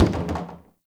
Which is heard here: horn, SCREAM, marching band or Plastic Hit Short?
Plastic Hit Short